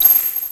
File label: perc hit